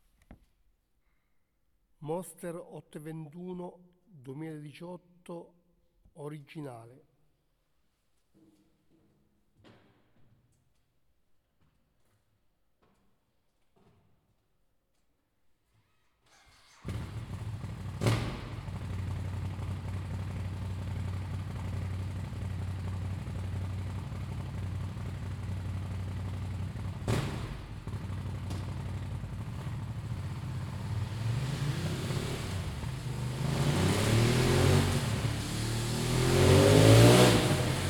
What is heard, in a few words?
DUCATI MONSTER 821 STOCK